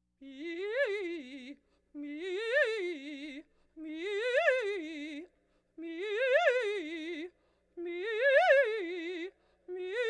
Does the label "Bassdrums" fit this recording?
No